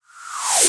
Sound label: Synthetic Blow